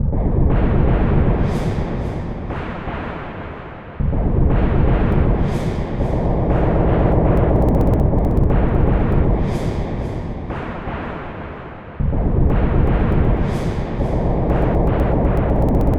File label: Percussion, Trance NRG, Drums